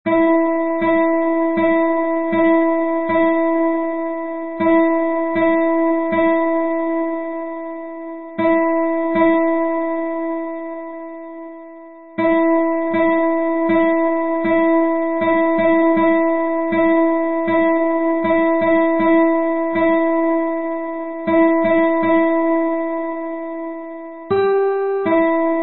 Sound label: Sixteenth Note Study 80 bpm